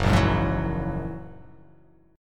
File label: F sharp 9th F sharp, chord